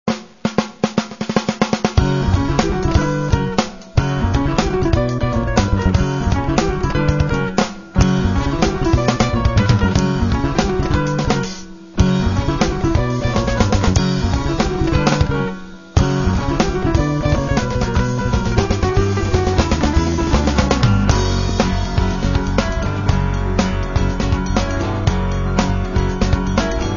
fusion orchestra

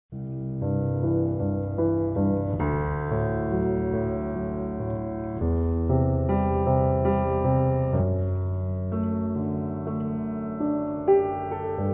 Piano

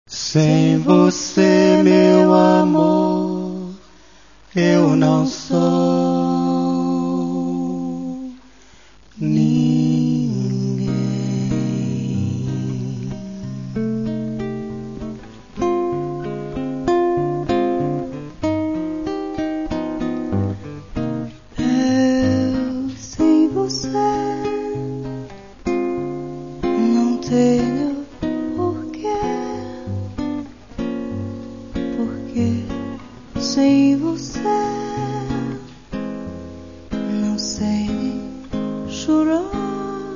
quiet music silent night

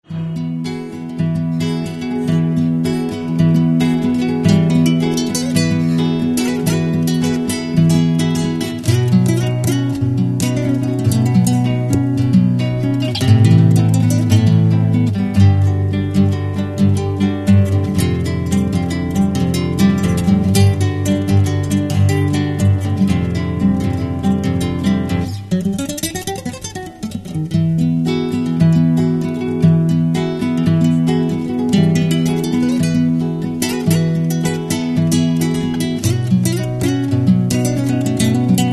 acoustic

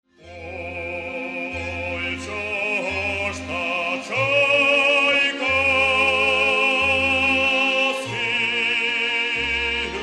Folk Choir; Volyn

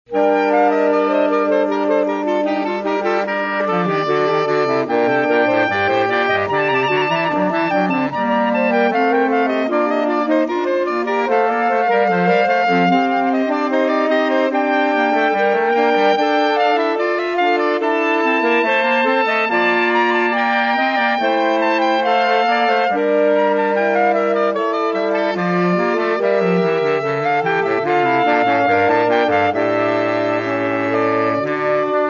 sax

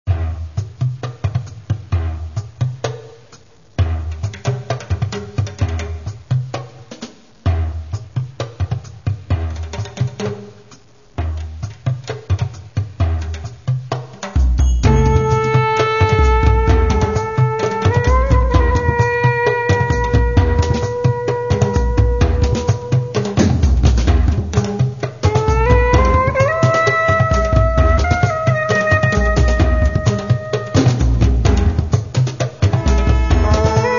orchestra

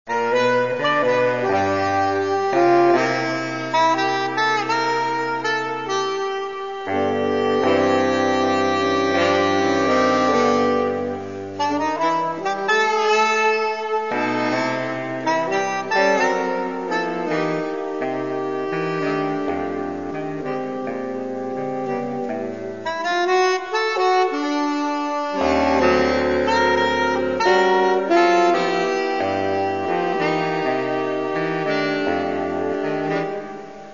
sax

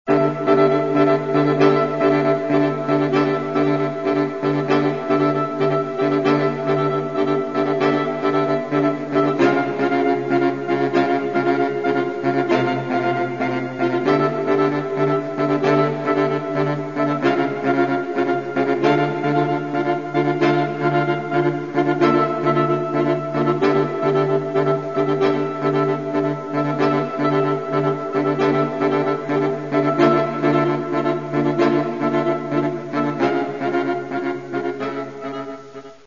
bravo sax modern

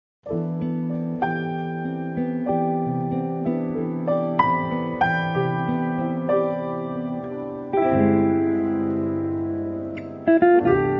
quiet jazz

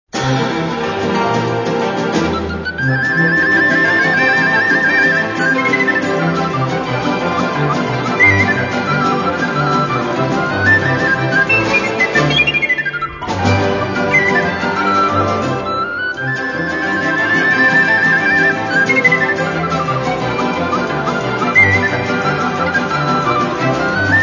sopilka

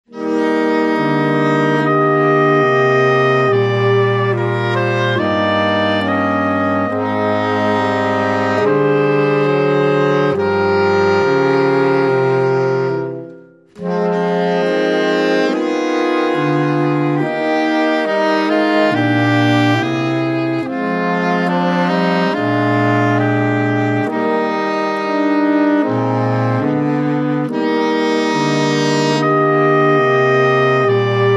sax

